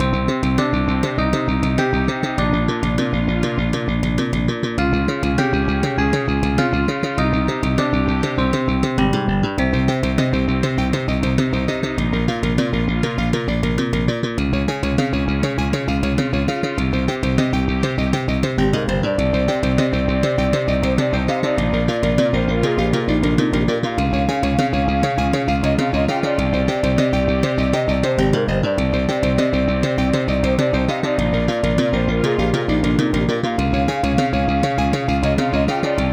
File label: LG MIDI ART GS1000R; Hocus Pocus